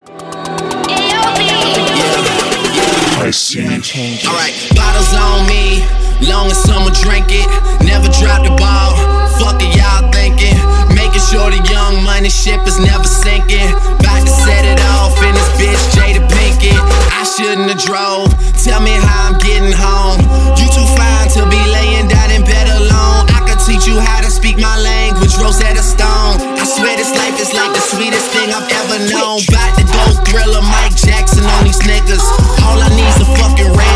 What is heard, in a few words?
remix